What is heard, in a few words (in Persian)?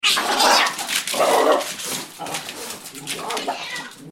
Sedaaye Gorbeh; معنای صداهای گربه